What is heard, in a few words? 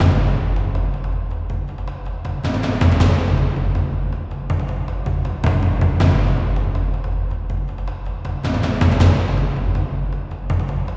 Battle Warrior Drums; rambo the video game 2014